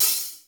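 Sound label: processed hat, drums; Crypton - DrumBase5000; PROC.DRUMS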